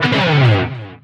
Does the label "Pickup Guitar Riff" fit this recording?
Yes